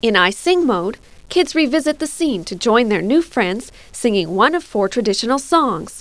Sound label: I Sing; Victor Vector & Yondo, The - The Cyberplasm Formula